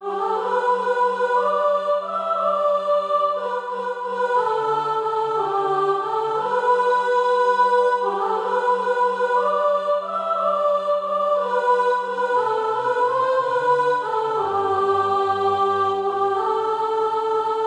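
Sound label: soprano, Practice Music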